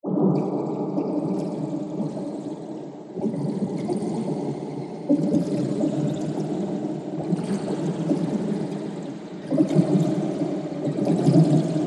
science fiction alien planet weird watery bubbles movements